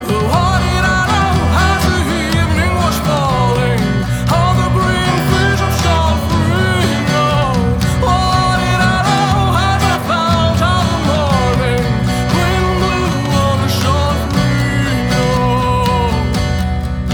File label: Ringtone